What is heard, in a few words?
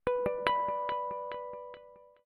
Reverberation; Samsung Galaxy-E5